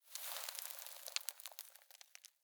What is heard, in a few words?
sand basket; ambient; dust2